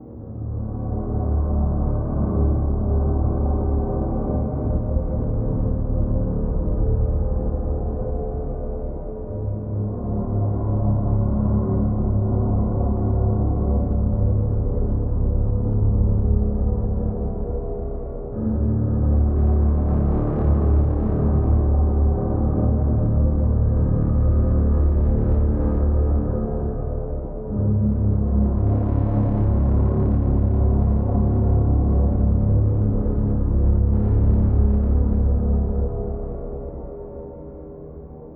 SYNTH AMBIENCE LOOP; dead in time; Enemy Territory

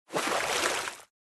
Swim Dive

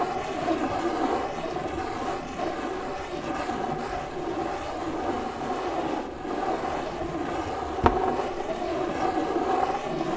Scrape Glasse Fast Loop

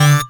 Pride Combo

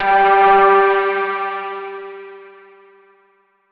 chime; PS4; Chime Sharp

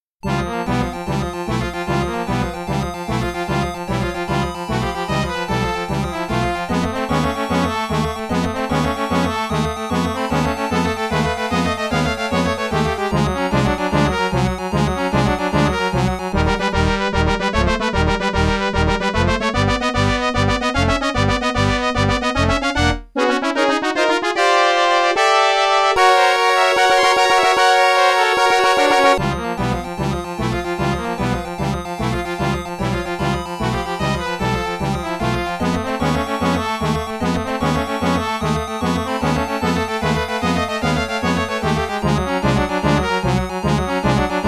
Raging wind

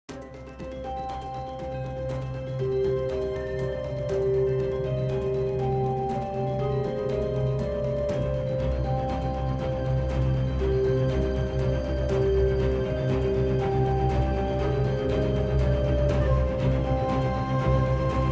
Ice Waterfall; Shades original game soundtracks part 2 2024

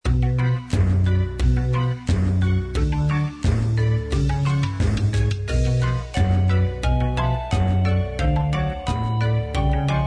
Monster Music

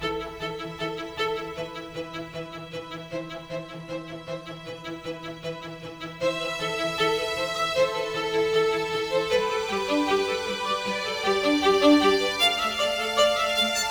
Music Speed Up Bowed; 7 Mages

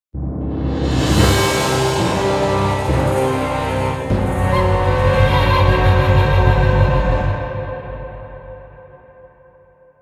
loose jingle; Otems Defiance

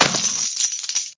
Imperial

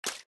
Splish

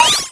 Roulette win

